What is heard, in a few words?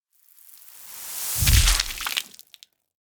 Water Spell Splash Attack Medium, Druid Magic; Rogue Waves